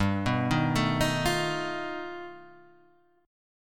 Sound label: G Augmented 9th, Guitar, Standard; G+9 arpeggiated; JGuitar